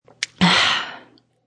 Female Satisfied Exhale; Exhaling; Voice

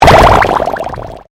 Convolution Pulse

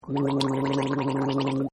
Gargle; Gargling; Sound Effects:Voice; Partners In Rhyme

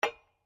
SLEDGEHAMMER METAL IMPACT LIGHT; Working with a sledgehammer; Hammers (Sound Effects:Tools)

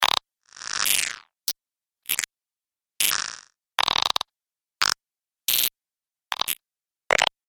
Cartoon Mechanisms; Cartoon Sound Design Sound Effects

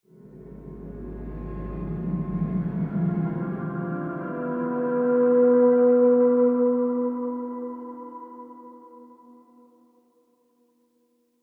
Horror Swell Suspense Relieved; Scary Tones; Blastwave; Sound Effects:Horror

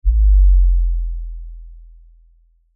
Lfe Descend Low Bass; Descending; Blastwave

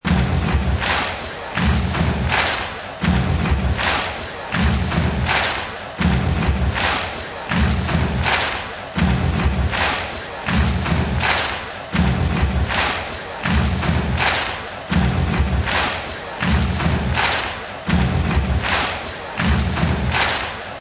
Crowd Boom Clap Loop, Boom Boom Clap crowd loop; Radical Boom Boom Clap crowd loop; Crowds (Sound Effects:Voice)

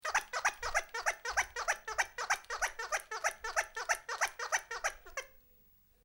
Toy squeaking, Toy, Small: Squeaking; Miscellaneous Toy

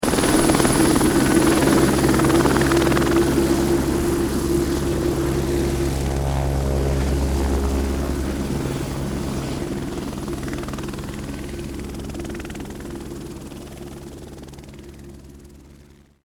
Helicopter Close Fly Away; Aircraft sounds

